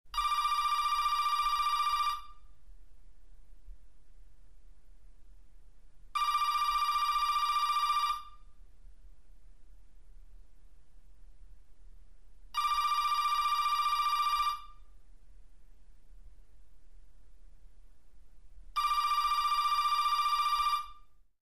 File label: Telephone Ring Prin, electric